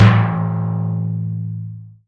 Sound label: hit, PERC; Techno Trance; DRUMS; Techno Trance Essentials